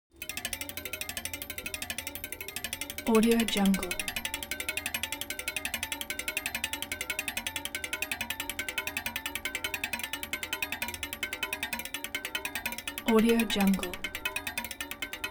transportation bicycle spin wheel spoke rattle

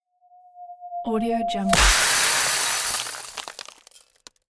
ghostly particles snap spill long impact

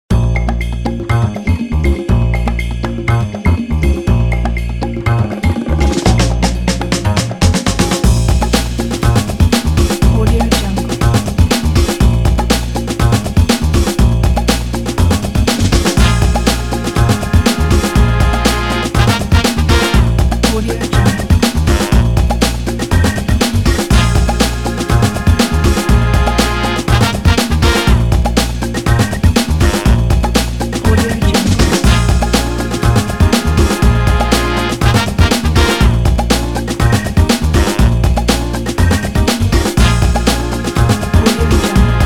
vintage retro funky pack; redoctopus

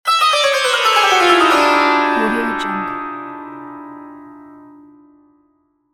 sitar transition

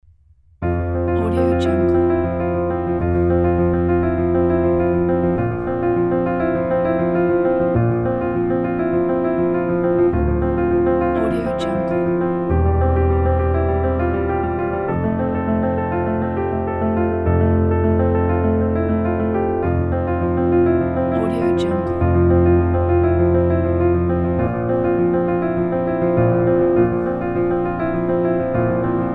inspiring piano

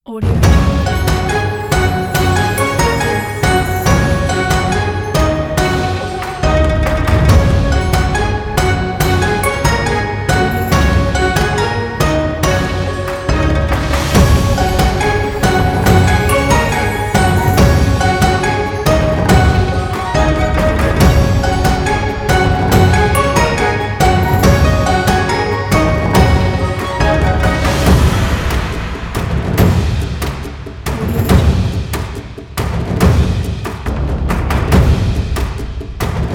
chinese new year

